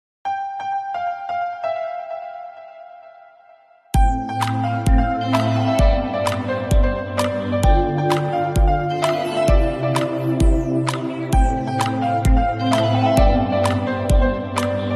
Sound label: Mobile Ringtone